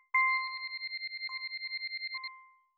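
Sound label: granular kalimba accent C major; bespoke - Floral v.2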